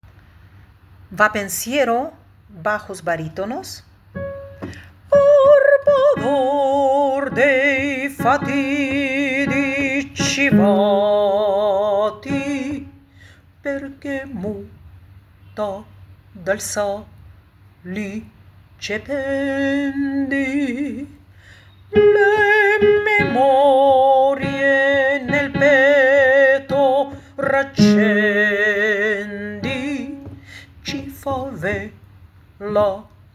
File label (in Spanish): bajos; vapensiero